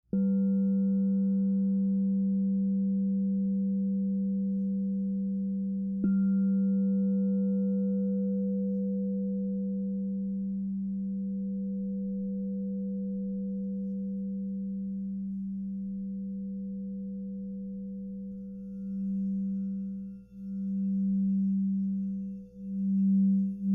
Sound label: Crystal Tones Singing Bowls